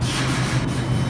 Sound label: air zero, R142; OpenBVE; Jerome Yard